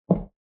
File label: footfall boot step; soundscrate